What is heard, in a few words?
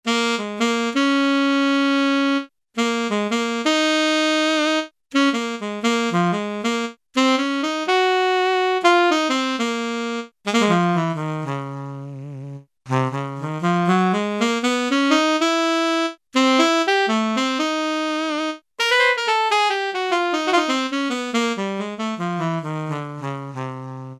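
Saxophone Alto; Brass And Woodwinds; Roland L-CDX-03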